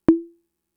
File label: Conga, Fricke MFB-522